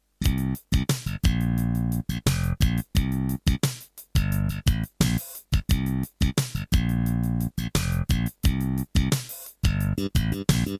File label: Terratec MiniWaveSystem SOWT-11